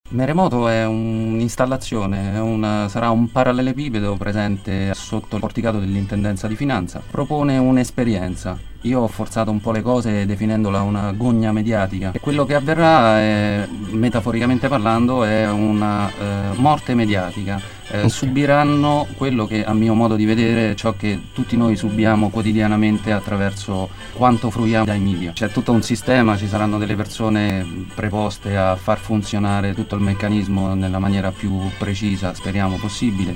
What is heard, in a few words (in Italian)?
piano